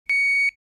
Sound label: Home office printer button turn off with a beep